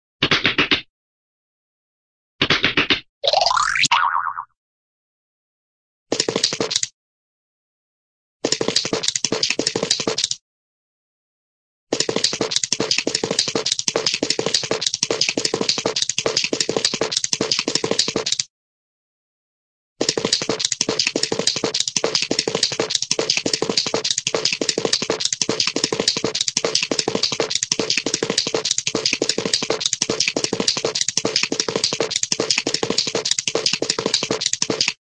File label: slap, cartoon fast slaps